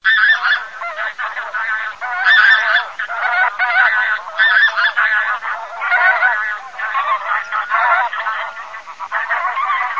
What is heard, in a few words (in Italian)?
OCA GRANAIOLA, birdsound